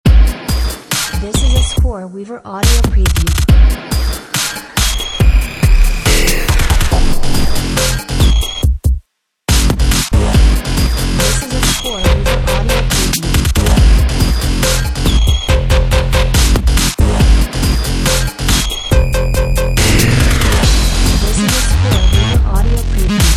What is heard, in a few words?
bass; bass factory; scoreweaver